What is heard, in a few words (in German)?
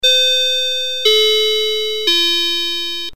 Klang; mistral 600